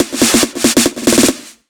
Fill 140 BPM

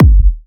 127BPM Infected Kick; Vengeance Samples

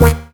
Synth Shot; Vengeance Samples

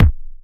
Soft Kick; Vengeance Samples

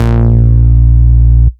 Root A#, Ultimate Bass; Vengeance Samples

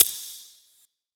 HiHat; Ghosthack

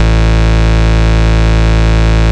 Bass Root A

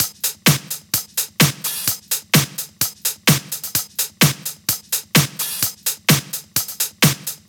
128BPM Drums; Vengeance Samples; Query Kit

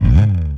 Slides; Vengeance Samples; VEH1 FX

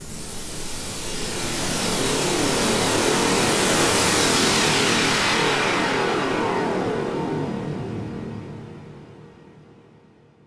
Downlifter

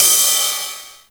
Ride, Cymbals; Vengeance Samples

